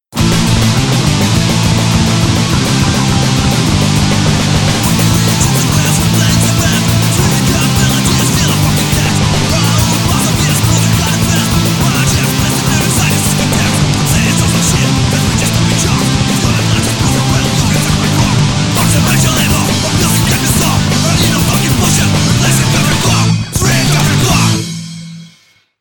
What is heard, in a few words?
dissent tree chord hardcore